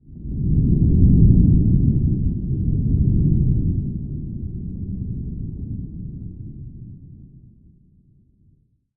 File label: shooter Witnessed